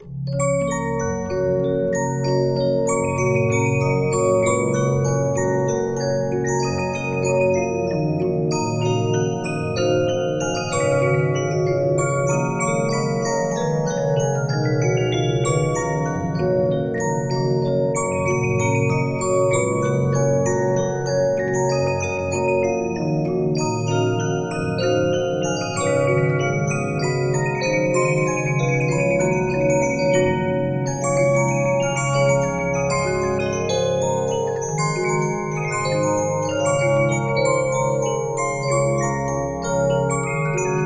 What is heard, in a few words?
musicbox, 15inch; Regina